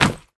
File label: enemy death land leg